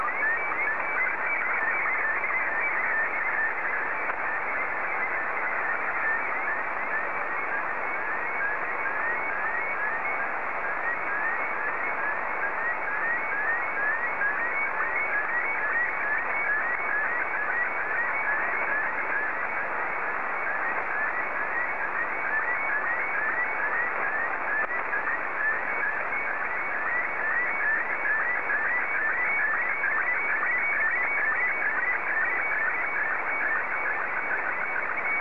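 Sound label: Martin 1, slow-scan television; JA3QJQ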